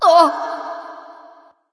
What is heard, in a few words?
grunt hit light; smite; voicepack; hel